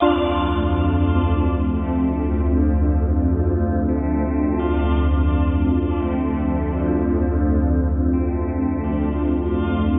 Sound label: Assist Mode Modal Loop